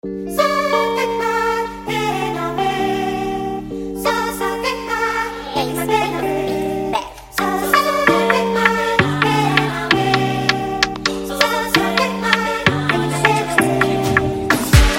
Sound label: Oriental Choir Sped up